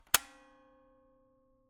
Camera, Kodak EK2 Instant, polaroid, large, plastic, springs, snap; Eiravaein Works; Vaeyan IV